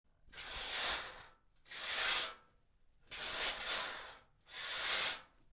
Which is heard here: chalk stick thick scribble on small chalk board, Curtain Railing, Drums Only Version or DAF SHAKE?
Curtain Railing